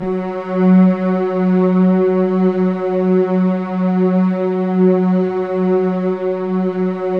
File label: Keypad; Standard Instr; Keypad 2